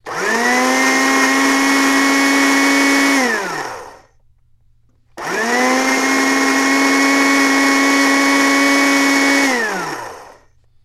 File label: Blender Banana Smoo